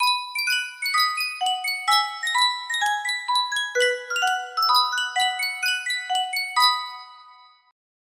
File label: sankyo miniature music box